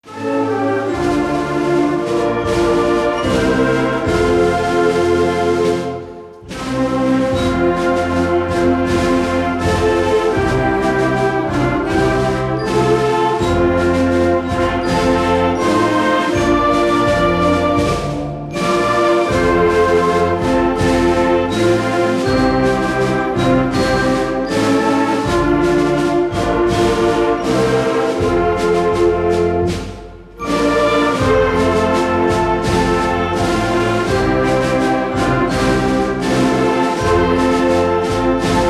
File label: auld lang syne, reed band